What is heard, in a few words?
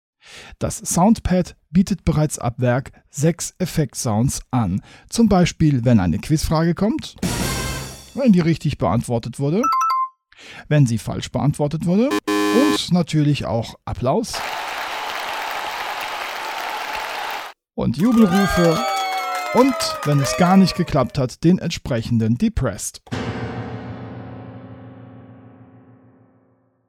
yamaha ag08 Sound Pad